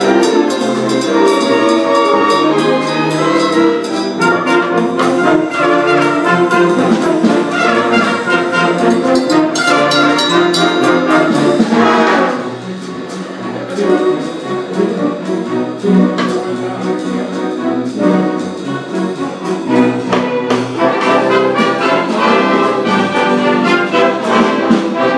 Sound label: conga